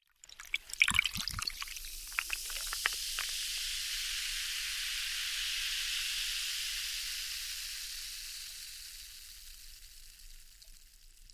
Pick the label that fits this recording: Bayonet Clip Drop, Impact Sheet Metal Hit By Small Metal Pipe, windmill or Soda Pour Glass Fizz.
Soda Pour Glass Fizz